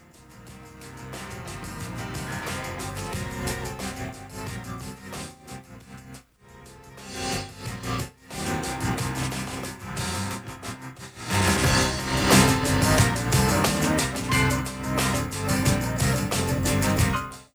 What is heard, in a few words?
pwm volume control, theremin; ews buffer exciter